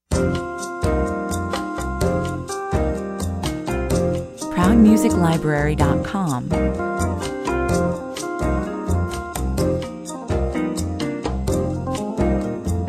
drunk old mambo, 127 bpm, A silly jazzy mambo piece